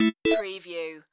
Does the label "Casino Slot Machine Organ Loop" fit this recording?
Yes